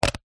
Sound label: mains power plug plug into extension block socket australia; household